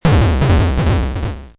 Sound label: se bonbonbon